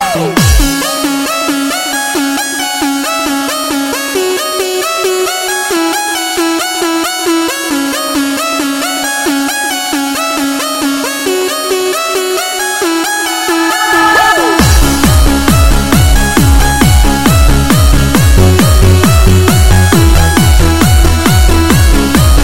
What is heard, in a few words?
Electro